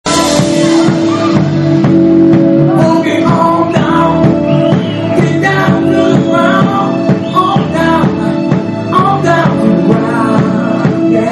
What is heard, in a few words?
R&B Solo